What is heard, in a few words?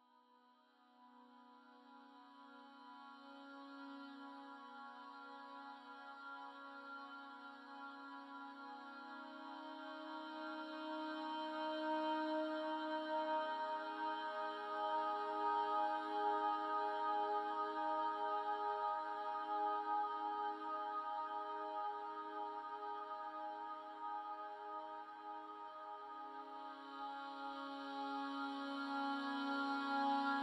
Exhale